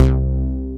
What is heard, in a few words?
Dist. Analog, baesse